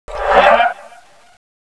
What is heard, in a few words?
alert; xash3d fwgs; controller